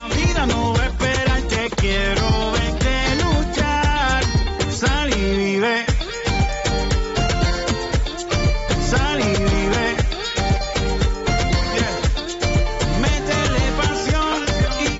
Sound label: FUNKY